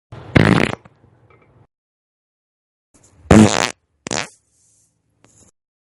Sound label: fart